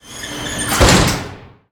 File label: Shutter